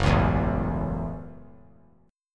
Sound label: Gb Minor 7th; strummed; JGuitar